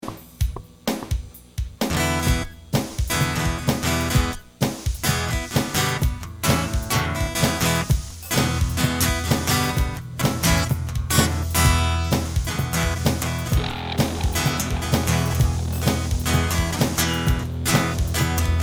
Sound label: Guitar Loop